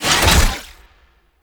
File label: Mech Omni Tool Stop; Warframe; Nechro Mech; Abilities